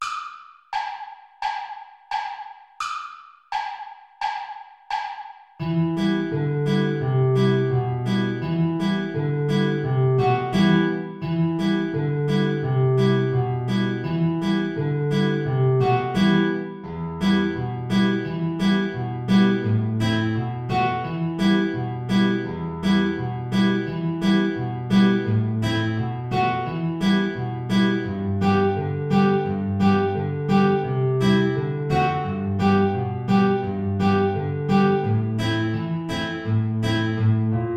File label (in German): Querflöte & Gitarre (Play along); Chiribim Chiribom